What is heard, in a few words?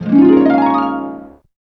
harp gliss C#; Best Service ProSamples vol.55 - Retro Sampler; AKAI; HARP GLISS+S